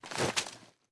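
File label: assault rifle inspect, weapons; gmod; viper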